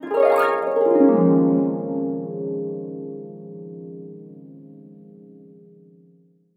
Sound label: Harp Gliss; Blastwave FX